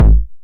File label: 909 BD Tape Sat; Goldbaby Tape808 & Tape909; The Tape 909